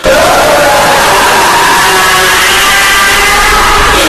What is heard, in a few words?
scary